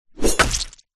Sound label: warfare sword swipe hit body impact hard squelch